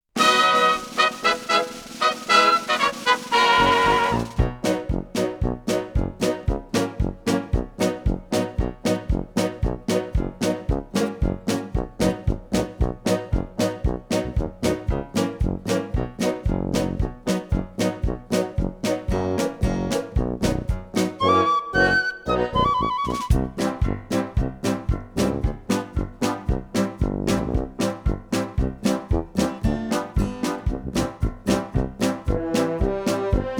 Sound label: London Bridge Instrumental